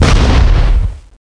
hit sound; It's Time to Learn About Diabetes

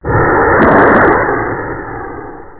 jet; Stratagem v1.01; Impressions

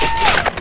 locked

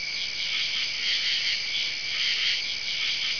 crickets; 10,000 Graphics Pack Volume 1; Media Graphics International

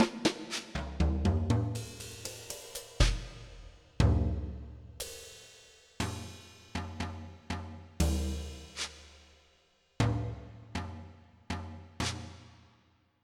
Mini Compressor

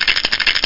snake; guess

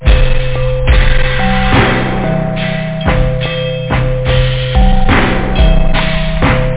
WATER; OctaMED SoundStudio V1

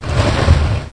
drag; impact

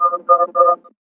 nav buoy; Earth and Beyond; EA Games

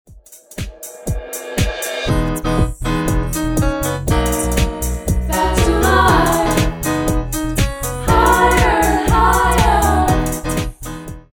Karaoke

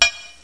hammer klank; NETSTORM

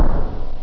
Placement Sound

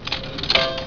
slot drop; MEGASLOT; 501 Great Games - Volume One; Guildhall Leisure Services

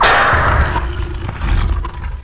rock wrm; duke nukem total meltdown

